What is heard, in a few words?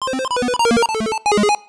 8-Bit Games Music Element Fail Arp